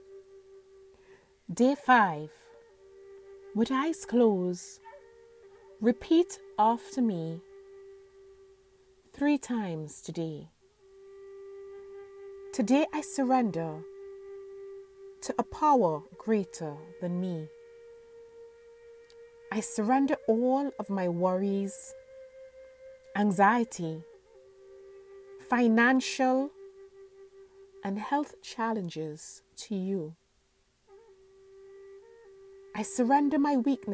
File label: healing mantra